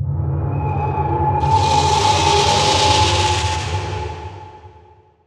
requiem cast; dota; nevermore

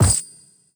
highvalue equip, inventory

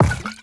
jug drop; ui; inventory

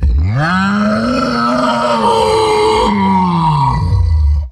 taunt; dota; primal beast

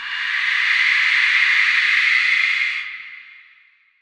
deadshot fear; weapons; dota; muerta

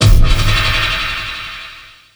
normal punch; weapons; dota; dark seer

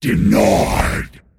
deny pain; pudge

